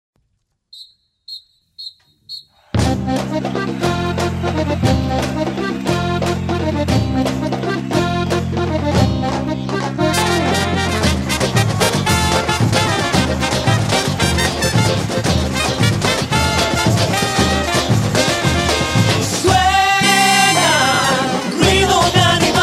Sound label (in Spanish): Cumbia